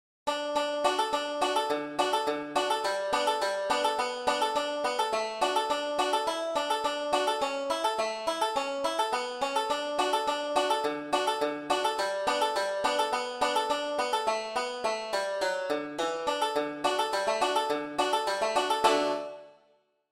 wreck of old solo, banjo; beginning 5 string

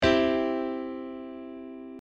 piano chord c; circle of fifths

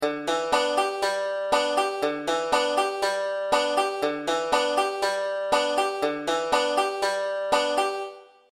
g w hammer, banjo; 5 string

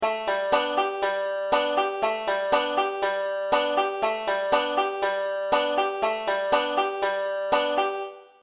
g w pull, banjo; 5 string

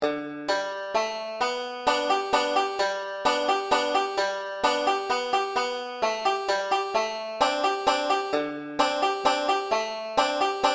down in valley solo, banjo; beginning 5 string

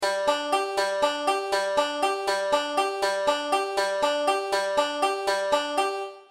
3 4 roll, banjo; beginning 5 string

